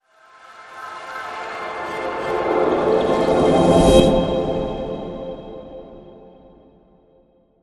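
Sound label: Enigmatic Angels Crescendo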